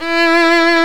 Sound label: STR SOLO, String; Roland - String Master Series; Chamber Grp1